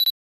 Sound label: camera focus, ui; Fujitsu; Arrows-Tab-LTE-F-01D